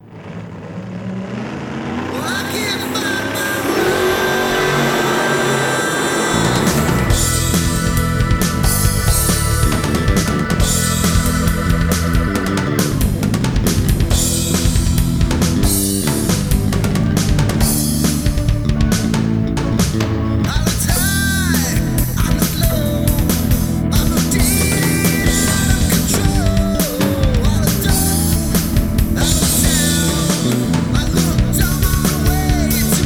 male keyboards bass; music smorgasbord

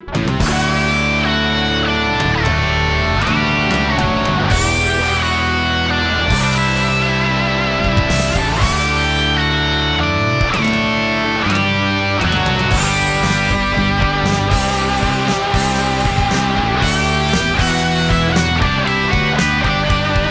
harmony karaoke; music smorgasbord

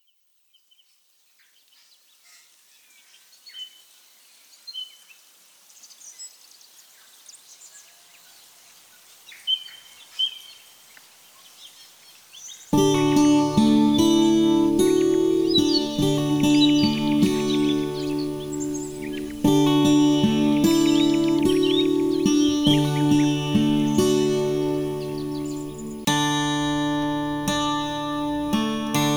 ambiance acoustic guitar; music smorgasbord

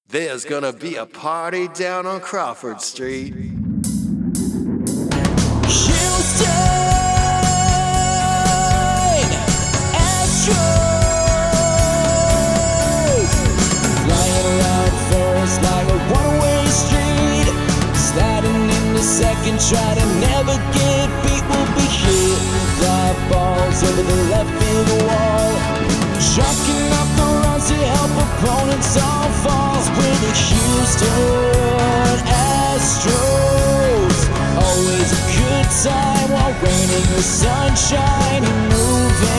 acoustic karaoke harmony

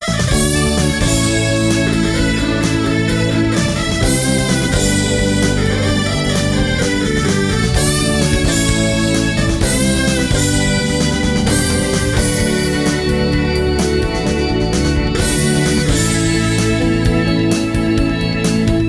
instrumental pianist

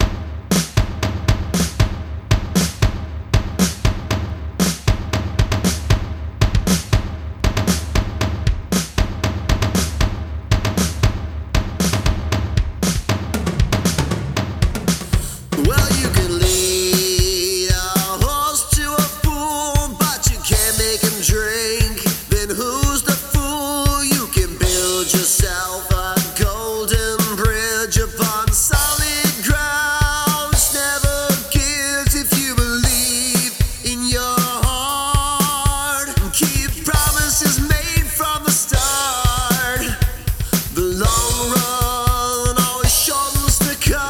vocals lead; music smorgasbord

